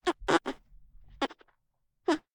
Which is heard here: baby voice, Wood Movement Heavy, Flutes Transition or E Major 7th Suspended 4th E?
baby voice